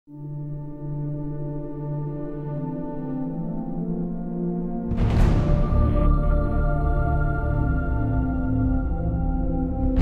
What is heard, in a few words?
music